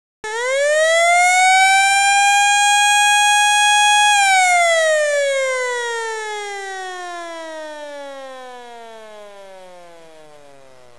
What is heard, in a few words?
siren, Federal Signal